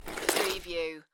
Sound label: Snow and Ice, Ice skate slide; Weather sound effects